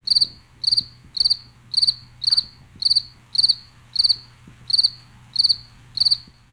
Amb Animals; Red Orchestra Ostfront 41-45

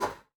Aircrafts F-5E Seat Adjustment Up End; DCS

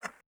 ui pickup spring; Rust

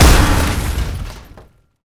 pod crash

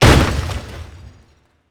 Damage Explosion In; DCS

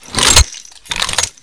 Inf Weapons Foley 3rd; Red Orchestra 41-45

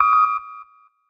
kick alert; War Thunder

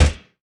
P-51D Cockpit Browning IN End; DCS

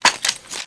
weapon; Call of Duty Modern Warfare

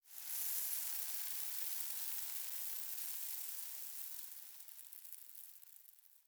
ambient; World of Tanks

